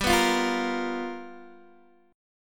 G 7th Sharp 9th G chord, Guitar Open D

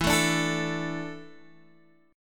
E 7th Suspended 4th, Guitar, Open G

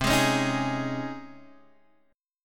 C sharp Major 7th Suspended 2nd C

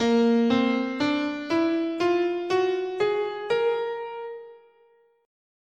Asharp Lydian Minor

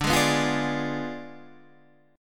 D Diminished 7th D down Guitar Standard; Ddim7 strummed; JGuitar

